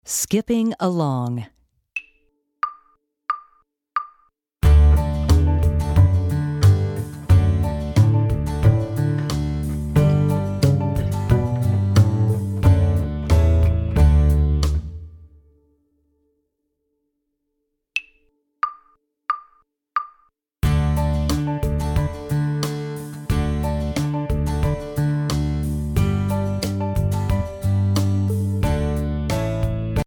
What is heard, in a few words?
Skipping Along, classroom orchestra; classroom orchestra method